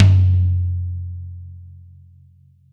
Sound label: tom 14